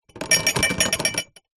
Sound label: household plastic tray tub containing glasses plastic cups short shake